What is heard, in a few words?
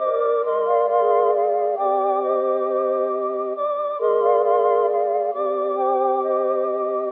electric flute; Loops; Electronic